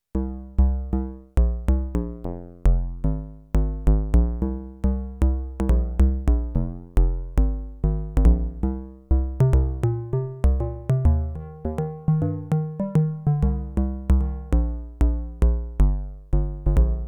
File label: Pulse Bass